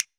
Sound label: F. SNAP, finger pops